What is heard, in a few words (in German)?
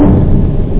pauke; Aminet 21; GTI - Schatztruhe